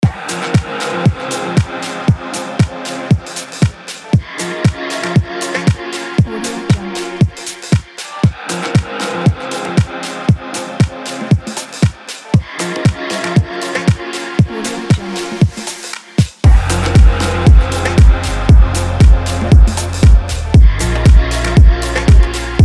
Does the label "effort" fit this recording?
No